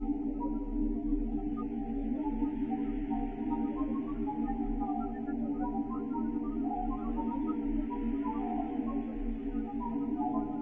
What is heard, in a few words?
ambient